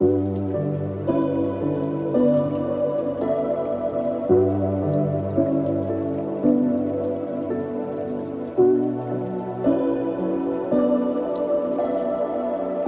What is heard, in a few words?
typewriter, rainy day lofi